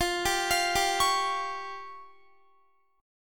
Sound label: F Suspended 2nd Sharp 5th F down Guitar; Fsus2#5; arpeggiated